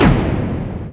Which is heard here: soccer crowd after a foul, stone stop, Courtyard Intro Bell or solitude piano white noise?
stone stop